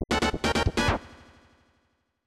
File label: Unused Jingle; BS Kirby no Omocha Hako Baseball; SNES